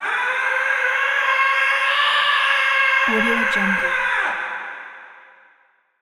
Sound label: scream; human sounds